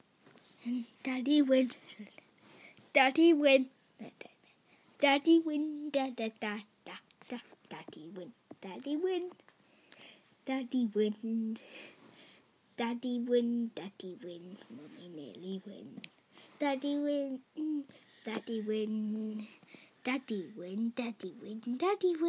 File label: encouraging song